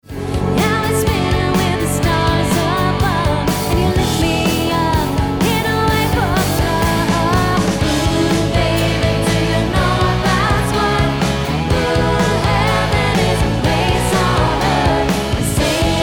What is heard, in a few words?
Heaven LIVE; Groove Bar Crown Casino